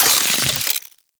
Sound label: Reload; Warframe; Weapons; Tenno; Wraith Sidearm; Epitaph Prime